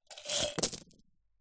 foley money coins tip out of metal tin onto carpet; zapsplat